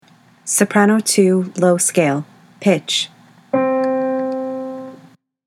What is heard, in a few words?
soprano scales low; all-state choir